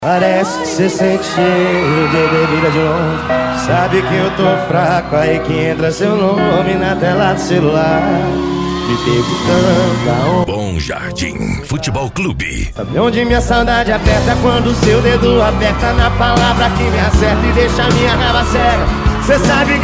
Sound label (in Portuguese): funk pisadinha sertanejo